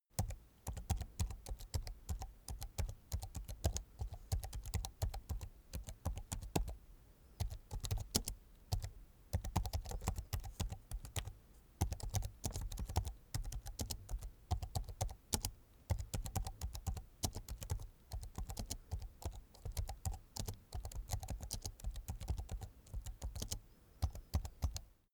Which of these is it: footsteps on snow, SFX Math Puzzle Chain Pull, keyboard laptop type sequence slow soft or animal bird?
keyboard laptop type sequence slow soft